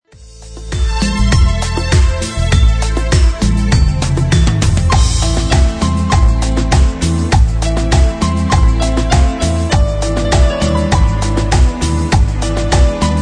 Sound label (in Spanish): Cumbia